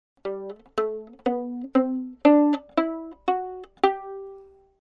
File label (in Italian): Pizzicato